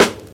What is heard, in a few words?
raw snare drum sound g sharp key, Loudest frequency: 1667; snare drum samples